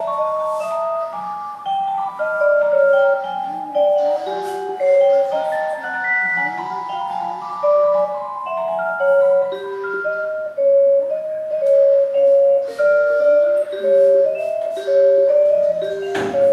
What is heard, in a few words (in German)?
lichtharfe